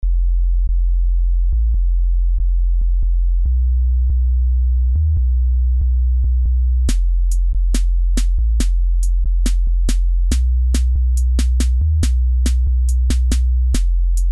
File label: quick bass test